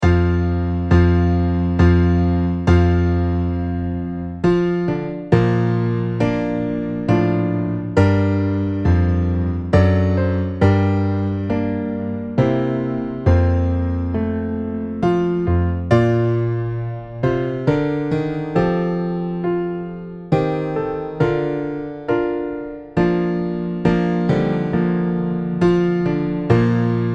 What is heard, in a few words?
star spangled banner piano